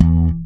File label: SLP POP E, PEDULLA BASS; Masterbits CLIMAX 7 - Bass